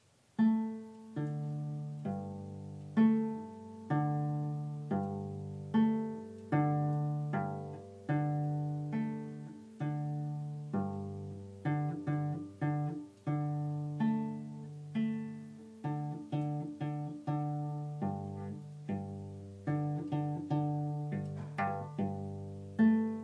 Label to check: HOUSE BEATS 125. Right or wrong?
Wrong